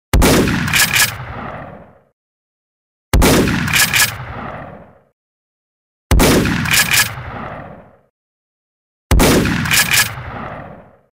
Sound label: Winchester 12 Shotgun